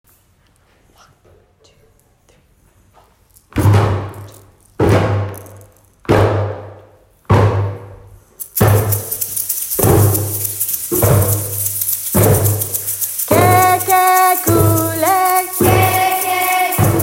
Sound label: African Drumming singing; Year 5